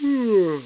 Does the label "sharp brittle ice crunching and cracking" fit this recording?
No